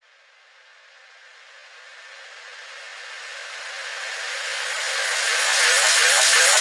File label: FX Uplifter